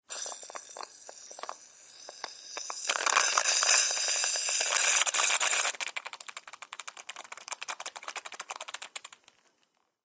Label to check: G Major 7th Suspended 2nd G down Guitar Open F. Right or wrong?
Wrong